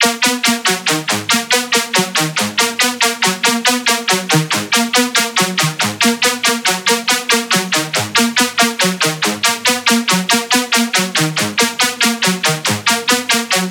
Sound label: rise